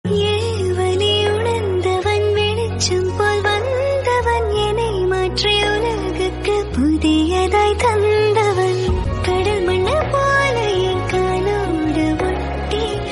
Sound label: tamil song